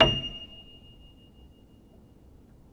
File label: Player, Upright Piano